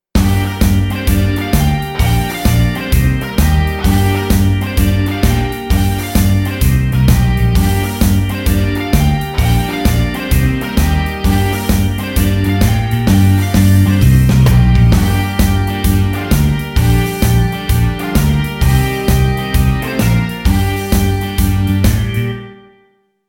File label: Penta in Gm 130 Rock